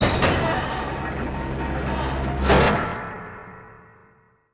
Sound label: Skill Key Down; UI